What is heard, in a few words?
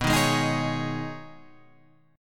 B 6th Add 9th B down, chord